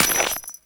Step Ice, Footsteps; Warframe; Grineer; Royal Jester; Foley

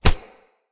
Unarmed Punch Impact Flesh

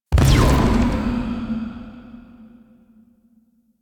Corruption UI Debuff; Warframe; Missions; Zariman

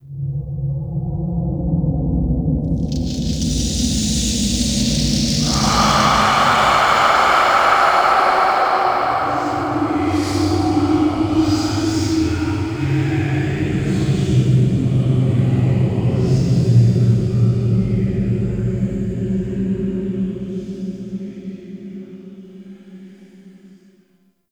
Reaper On Bridge Turn Point; Wraith Quest

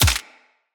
Bullet Impact Head Hit Notification; Weapons

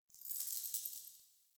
Idle Head Shake; Tagfer; Warframe; Ambience; Entrati

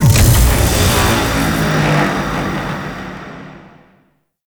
Void Dragon Ring Transference Unlock; Enemies; Gameplay; Warframe; Duviri

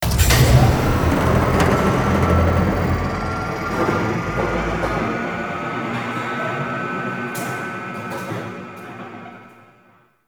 Ship Jump Start; Cinematics; Warframe; TheNewWar; Zariman

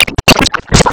Pulse Cast; Warframe; Gyre

